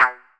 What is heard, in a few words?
Stabs, Elektron MachineDrum SPS1 MKII